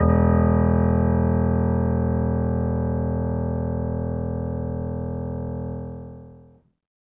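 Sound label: Acoustic Piano, Right; InVision Interactive; Miroslav Vitous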